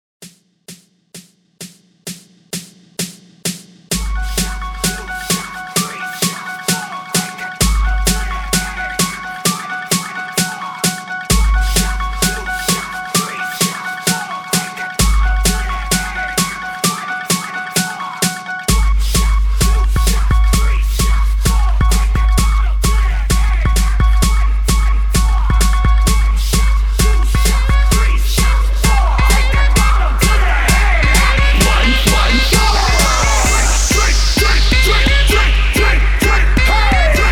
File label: HALLOWEEN PARTY STARTER 130 BPM